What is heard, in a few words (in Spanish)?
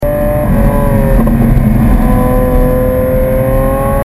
arranque y sonido del motor start up and engine sound; lamborguini; lamborguini para dlive